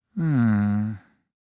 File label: hmmm